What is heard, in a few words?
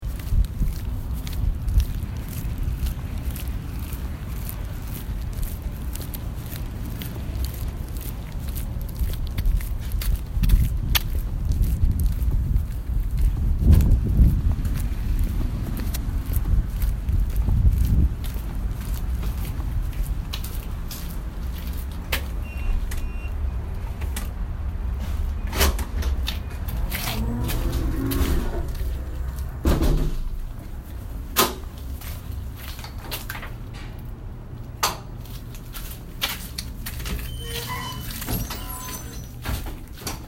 Feets